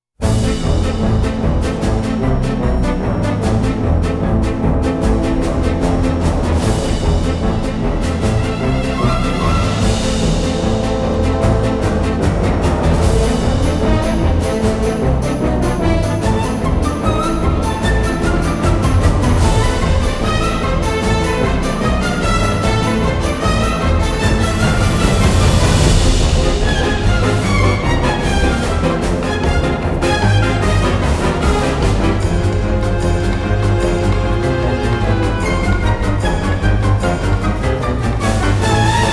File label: no choir; immediate music